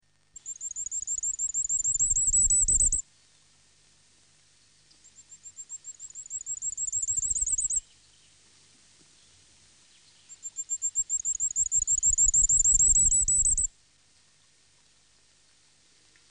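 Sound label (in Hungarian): Tüzesfejű királyka; Madarak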